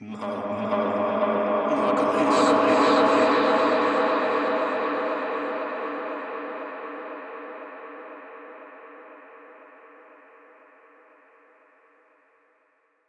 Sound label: Finale Dialog Effect; Cinematics